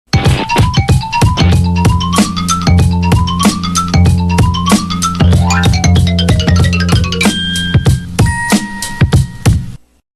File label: xylophone of cartoons